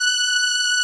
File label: SOLINA, String Machines